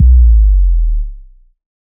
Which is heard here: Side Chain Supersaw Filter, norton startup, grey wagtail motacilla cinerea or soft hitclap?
soft hitclap